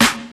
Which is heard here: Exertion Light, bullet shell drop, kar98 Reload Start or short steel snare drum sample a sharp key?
short steel snare drum sample a sharp key